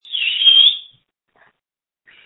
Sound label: screams